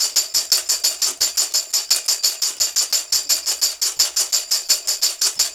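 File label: TAMB, tambourine; Drumdrops In Dub VOL-1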